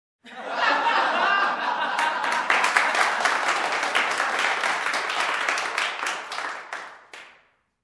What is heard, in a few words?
Audience in a close room laughs and claps